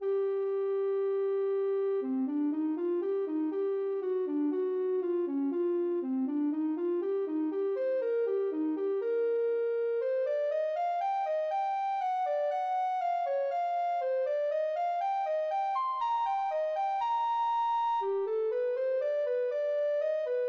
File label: ocarina